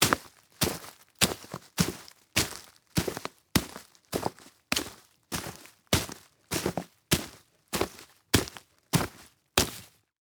Foley Footstep Work Boots Leaves And Twigs Debris Fast Walking; Foley Footsteps